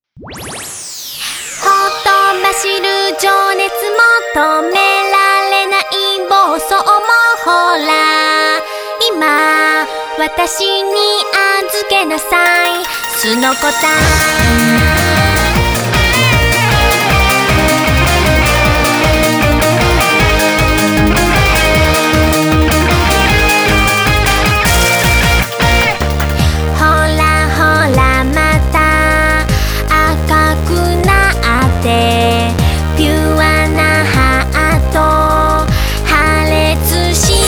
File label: song